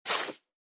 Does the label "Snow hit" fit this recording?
Yes